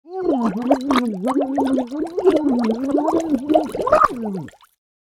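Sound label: person choking, drowning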